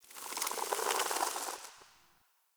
Foliage Growth E; Powers; Fey Eldveil; Eldveil Stillness

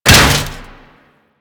Mace Impact Metal

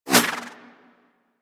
Throwing Star Whizzby; Warframe; Weapons

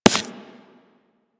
Laser Impact Generic; Surface

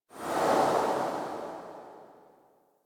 Museum Item Look Whoosh; Warframe; Vitruvian Museum

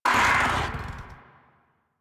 Catbrow Combat Vocal Attack Medium; Warframe

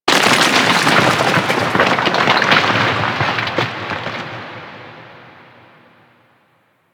Rock Fall Large; Ambience; Warframe; Corpus Outpost

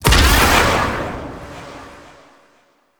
Blade Explosion; Warframe; Pax Duviricus

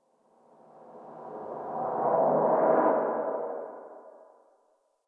backwards whisper yell; ambience

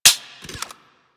Fire Mech, Rifles; Snipetron

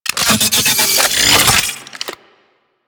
Sniper Rifle Mode Switch Retract; Warframe; Weapons; Corpus

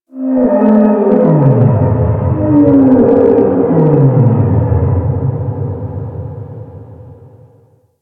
Ocean Whale Call; Ambience; Warframe; Grineer Ocean

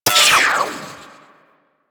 Arch Cannon Mech, Weapons; Warframe; Archwing

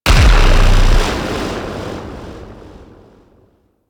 Tengu dive bomb heavy; Warframe

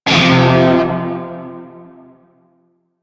Shawzin Chromatic Chord I; Music; Warframe; Narmer Shawzin

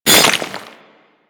Melee Impact Cement; Warframe; Weapons

